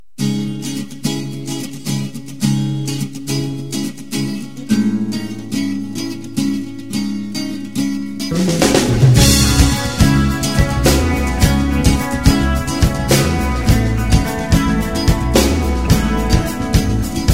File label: Adat Music